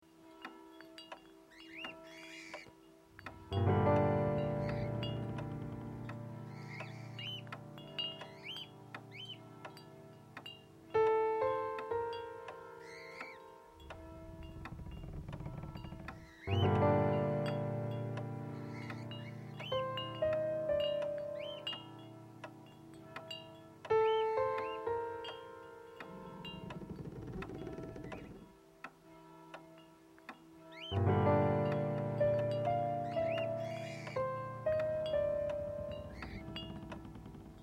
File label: Melodic Fill In